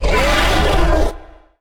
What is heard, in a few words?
wither storm scream